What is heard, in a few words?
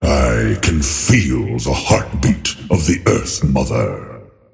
arcana drum; dota; earthshaker